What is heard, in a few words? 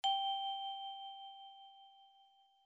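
vibraphone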